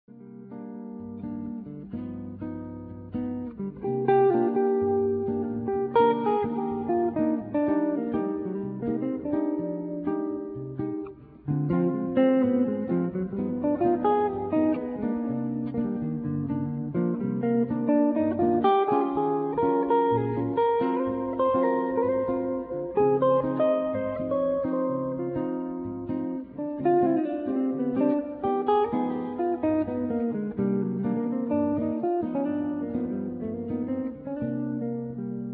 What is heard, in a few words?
bossa nova